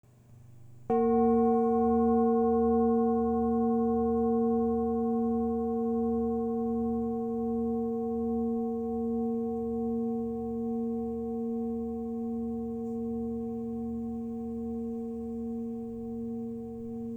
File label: Manjushri 2291hz